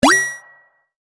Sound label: Digital Signal Wapling; Digital Sound Effects; LYN094 package of Digital Clicks, Blips & Alerts